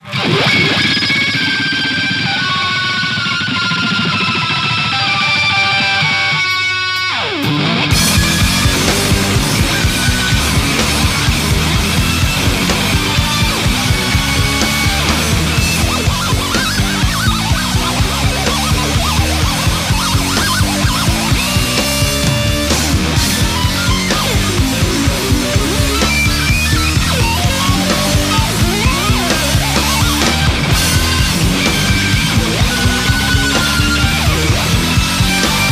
Whammy Riff

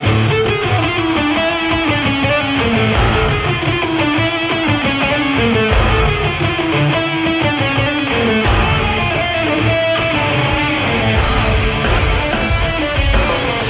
Riff

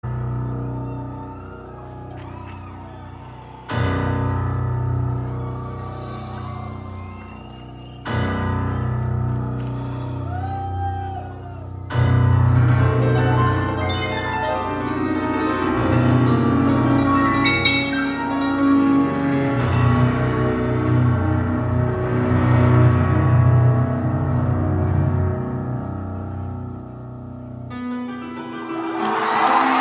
piano intro

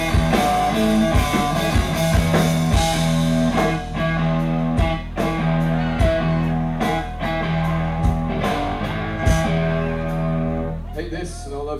riff

